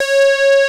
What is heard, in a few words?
SOLO SYNTH, JUPITER 8; Greytsounds VOL-1